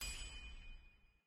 Attack Hammer

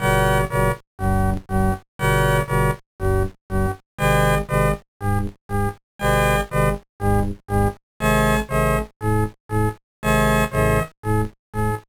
Jingle, gba

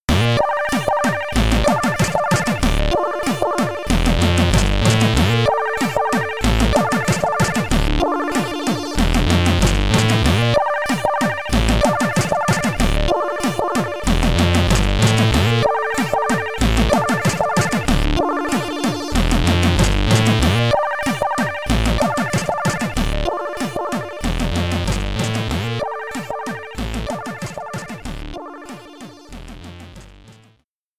Loading Tune (SID Stereo); starforce; commodore 64

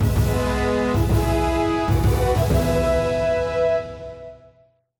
Combat Arena (Success); Avatar The Last Airbender The Burning Earth; ps2 wii xbox 360

